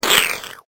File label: slurp